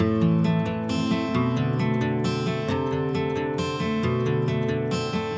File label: guitar, Jazzmatazz Loops